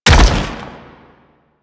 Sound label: Step; Warframe; Sentients; Erra; Foley